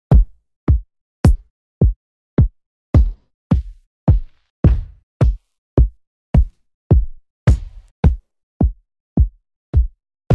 DRUM KIT; VICTIM DRUM KIT

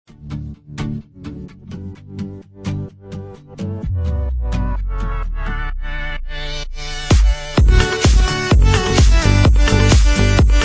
Modern Pop EDM; Big Fish Audio Escape

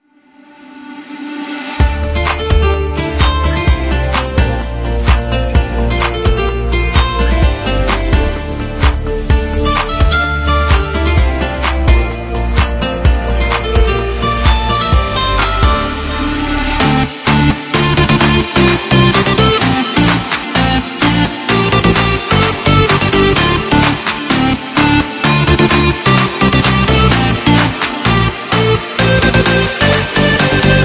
EDM; Singomakers EDM Ultra Pack Vol 5

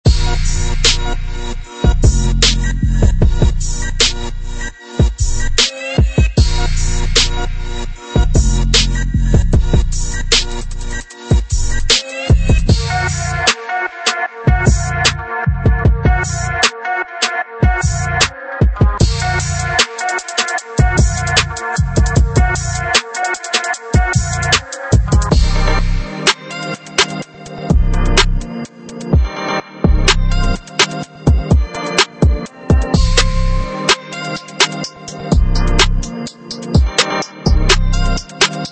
Trap City; Hall Samples

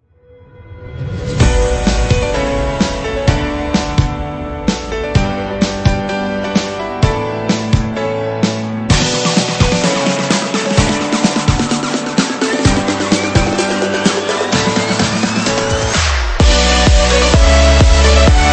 PROGRESSIVE MELODIC HOUSE

